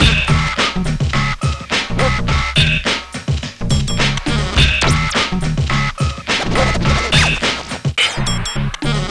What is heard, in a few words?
Scratchin